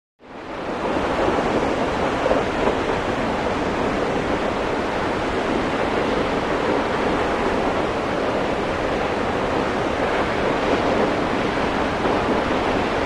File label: Waterfall Large Gus